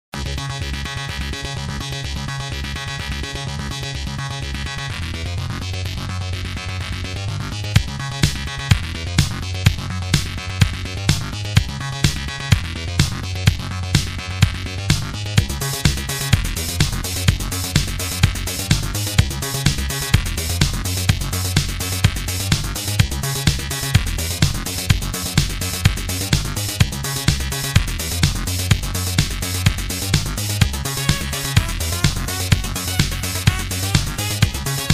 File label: 8bit